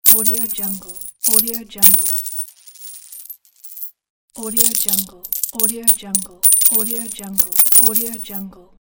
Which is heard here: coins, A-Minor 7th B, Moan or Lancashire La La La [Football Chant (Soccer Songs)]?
coins